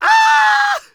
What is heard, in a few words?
SCREAM Male mono